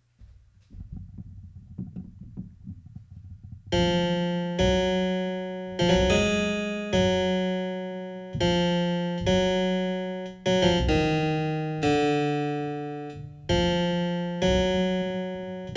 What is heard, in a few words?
riff